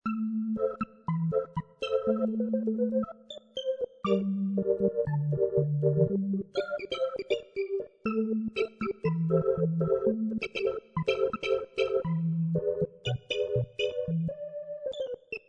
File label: Organ, Bossa Nova; Surround Session